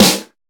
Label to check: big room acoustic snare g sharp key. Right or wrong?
Right